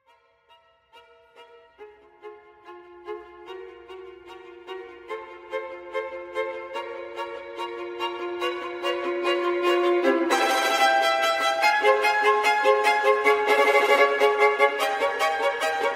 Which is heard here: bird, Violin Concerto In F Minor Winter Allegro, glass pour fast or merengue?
Violin Concerto In F Minor Winter Allegro